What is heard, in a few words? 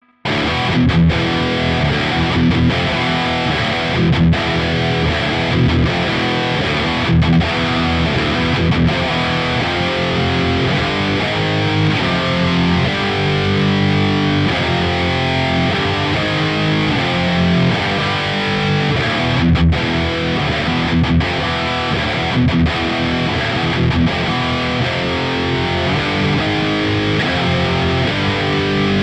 Relaxing Guitar Reassuring Riff